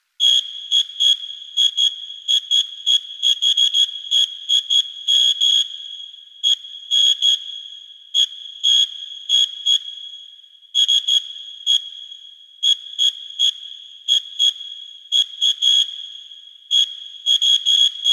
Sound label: Cave Bedroom Hospital Device Loop; Ambience; Warframe; Stalker Cave